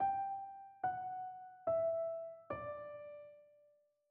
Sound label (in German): Tetrachord Mix g ab; Tetrachorde